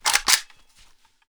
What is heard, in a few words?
ak47 cock, weapons; garrysmod